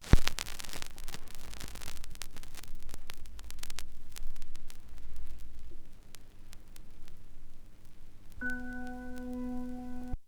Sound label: vinyl; Masterbits; Vinyl Attack